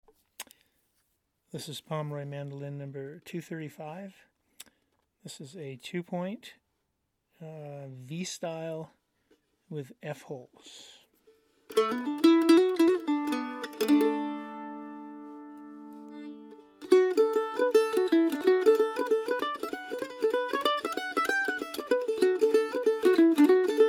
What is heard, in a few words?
mandolin